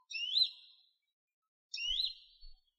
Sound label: coast bird; matmos hl